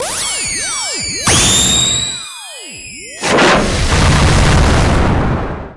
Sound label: enemy se